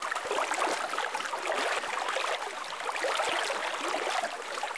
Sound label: pool